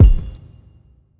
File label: Magic Kick